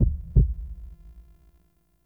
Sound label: Heartbeat Solo; Operator Nursery End; Warframe; Cinematics; Orbiter Nursery